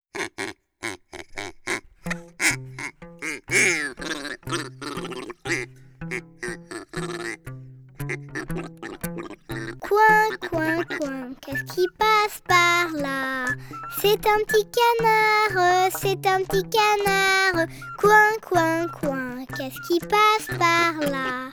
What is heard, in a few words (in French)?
Coin coin coin, Comptines des animaux de la ferme; kids